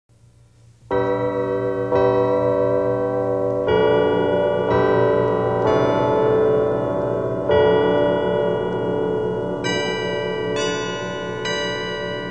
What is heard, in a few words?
carillon chimes